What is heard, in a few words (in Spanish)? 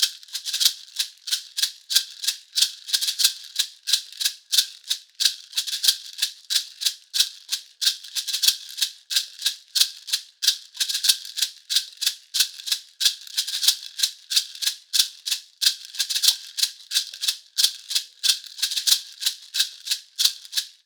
bolero 46 maracas